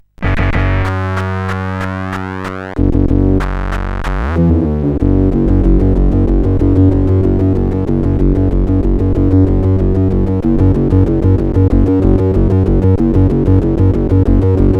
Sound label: Heavy Phasor, sid CSG8580